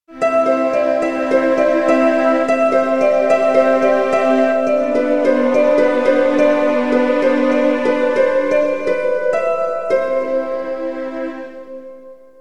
Tiny Melody Stinger Theme

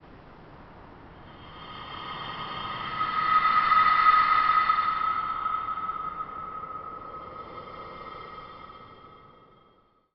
Horror Moment

